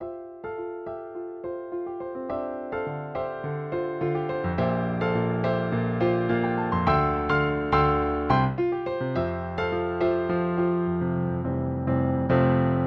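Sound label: Grand Piano, Yamaha P-225; پیانو دیجیتال یاماها p225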